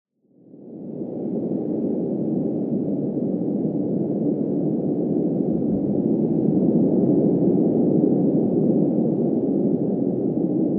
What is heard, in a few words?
nature cave ambience wind outside muffled strong; RPG Maker; Grimm's Hollow